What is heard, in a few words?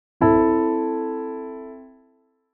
A Minor, akordy